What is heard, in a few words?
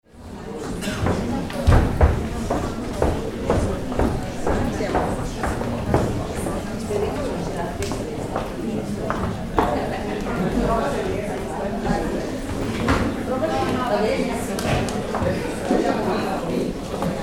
hall small atmo